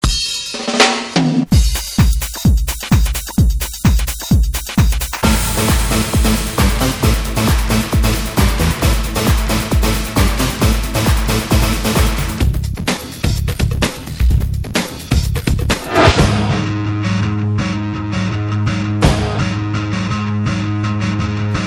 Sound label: drum, jingle